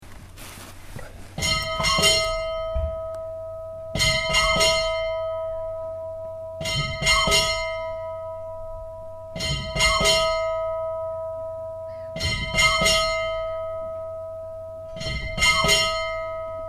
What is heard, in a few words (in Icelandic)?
stadarbakkakirkja messa, kirkjuklukkur; hunavatns-og-skagafjardarprofastsdaemi; 30 mín fyrir messu; Staðarbakkakirkja var reist árið 1890; Í turni kirkjunnar eru tvær klukkur